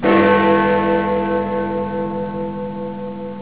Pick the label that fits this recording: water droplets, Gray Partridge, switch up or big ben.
big ben